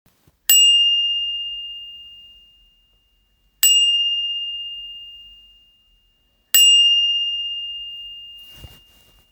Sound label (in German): Maus